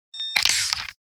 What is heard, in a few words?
CAMERA SHUTTER